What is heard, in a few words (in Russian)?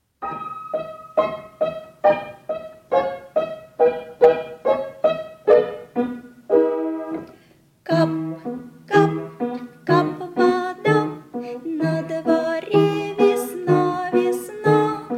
golos i fortepiano